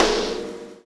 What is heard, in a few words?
Snare Shard; Sonic Foundry (Sony Creative Software) - Processed Drumkits Zero Gravity Beats; Shards